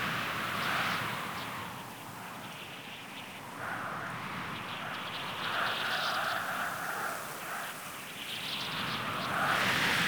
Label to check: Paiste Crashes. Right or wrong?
Wrong